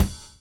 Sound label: RIDE, RIDING; AMG Gota Yashiki Groove Activator